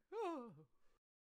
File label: Candlehead Death Vocals